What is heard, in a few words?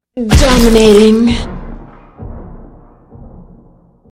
music stinger; killstreak music